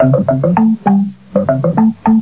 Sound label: percolator, coffee